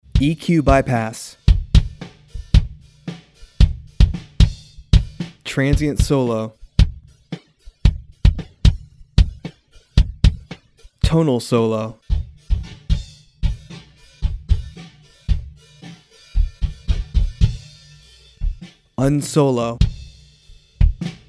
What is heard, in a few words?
Kick, ドラム・キック – バイパス / トランジェント / トーナル; トランジェント（ノイズ、ポップノイズ、マイクの吹かれ、ボーカルの歯擦音、アタック）; トーナル（持続音、倍音、トーン、アンビエンス）; SplitEQ